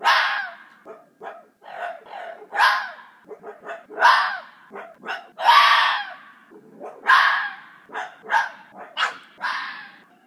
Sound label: Wild Animal Screams